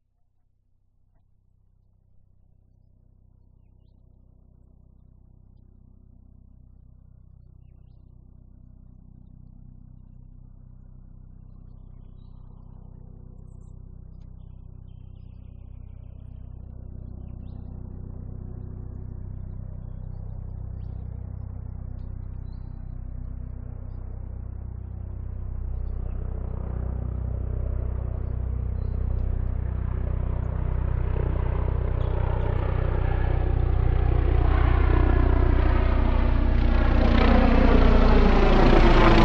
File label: transport helicopter pass overhead